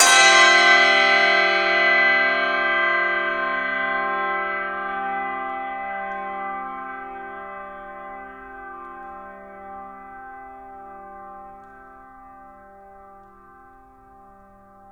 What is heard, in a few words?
CAMPANILE; 3D Sound; 3D Keys VOL-1